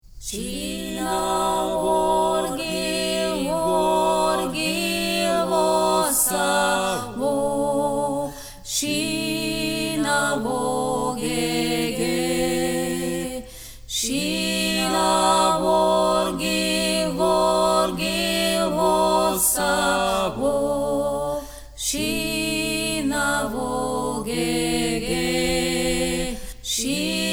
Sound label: tutti